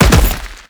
Land Heavy Dirt, Footsteps; Enemies; Warframe; Teshin

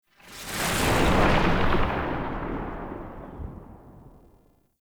Sniper Tail; Warframe; Sentient; Caliban Prime

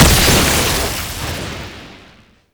Sniper Lightning Strike; Warframe; Sentient; Caliban Prime Sniper

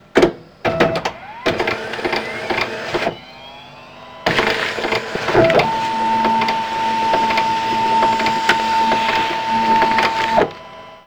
Office Fax Machine Misc

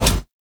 Scan Impact Pre; Frumentarius

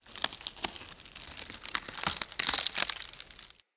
tree root bark crackle slow; Ambience; Under City

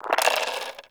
Roll Dice; Cetus Vendors; Five Fates

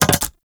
Animal Lure Enable Mech; Warframe; Venus Animal Lure

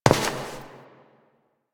Step; Warframe; Koumei

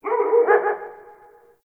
Distant Ambient Dog Bark

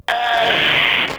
Inked Humanoid Pain, Enemies; Warframe; Shadowgrapher

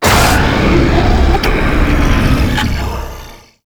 Puzzle Button; Warframe; Duviri Murmur; Medusa Puzzle

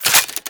Laser Aim Rifle Reload; Warframe; Grineer

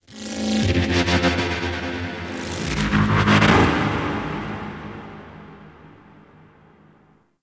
Tech Texture Med; Warframe; Ambience; Cephalon Weave

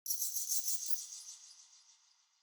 Under City Bat Chatter Distant

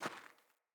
Grunt Boot Walk Gravel, Footsteps, Boots; Enemy; Sinecure; Melee Grunt

